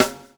SLICK, Backbeat

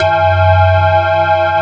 METAL BAZZ

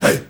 HEY HEY; Heart of Africa; Spectrasonics; Heart of Africa 1; CH. SHOUT2

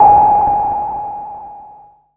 EFX, SFX KIT; Wizoo - Platinum 24 Electronic

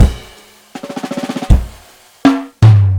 160 live jungle, breaks

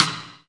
LW WET SID, Drum Mashines, SR-16 DRUMS; EdgeSounds

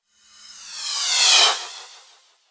Reverse

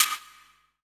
2 H M 89 PERC, Burning Grunge Hip Hop

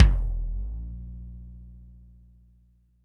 percussion, Roland TD-20

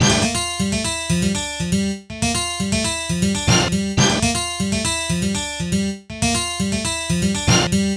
Nylon Guitar; Sonic Foundry (Sony Creative Software); Mac Money; Guitars

